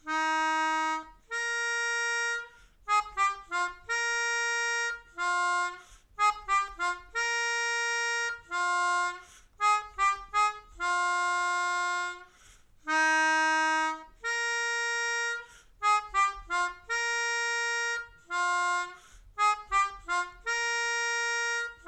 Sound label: Melodica